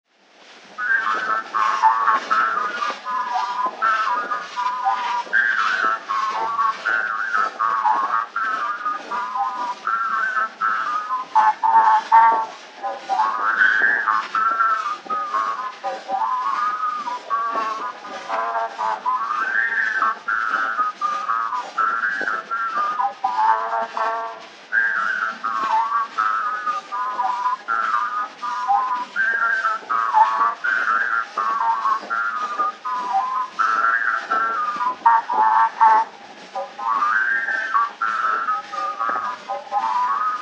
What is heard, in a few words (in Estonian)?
valts, parmupill, folk; Joelahtme; Kumbi